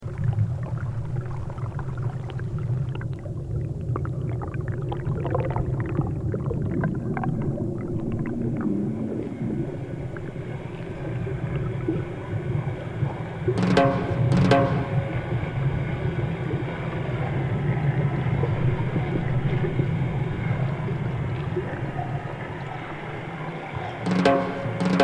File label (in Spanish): agua tambores